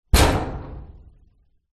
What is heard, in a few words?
impact metal wall fist; zapsplat